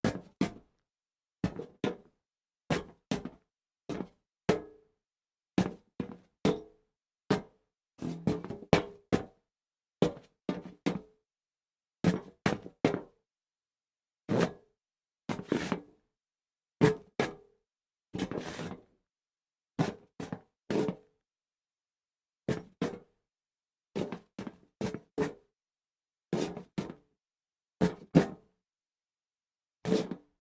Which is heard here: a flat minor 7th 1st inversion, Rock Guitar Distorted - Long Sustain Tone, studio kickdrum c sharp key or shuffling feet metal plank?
shuffling feet metal plank